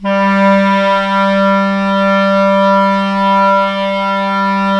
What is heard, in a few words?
clarinets, Wood Winds